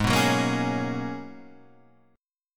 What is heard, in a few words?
G sharp Minor 11th G sharp, guitar chord; G#m11 Chord {4 2 4 3 2 4} strummed